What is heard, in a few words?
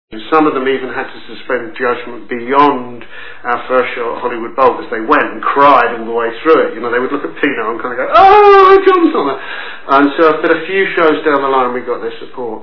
cry